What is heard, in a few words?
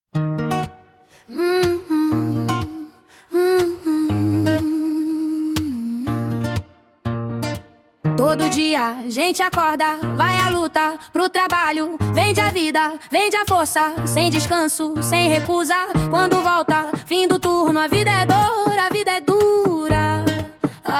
jingle